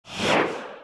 zeal

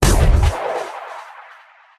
eclipse impact miss; csgo; wcs go; luna

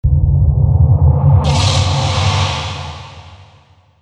requiem cast

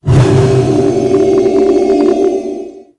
heal

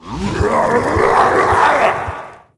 shamanistic rage; csgo; wcs go

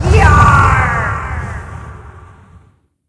pain suppression

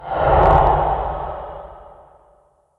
antimagic; csgo; wcs go

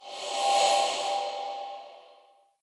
stance heal monk

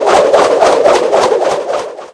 whirlwind; cstrike; wcs

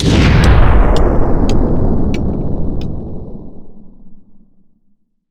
chronosphere

